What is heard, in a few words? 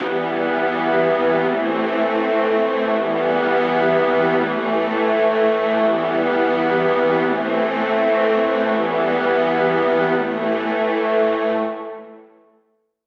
strings 83 Em